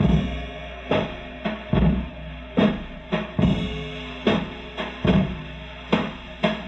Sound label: Loop, rhythmate, classic machines